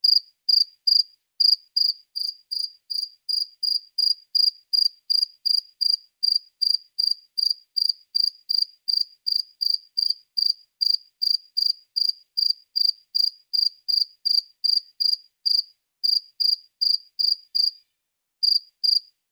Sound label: crickets at night